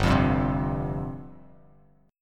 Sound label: G 7th Suspended 4th G down Bass Standard; G7sus4 strummed